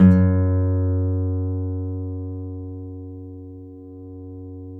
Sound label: NYL F#, FLAMENCO NYL; Spectrasonics; Hans Zimmer Guitars VOL-2